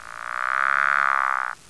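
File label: pickerel, frog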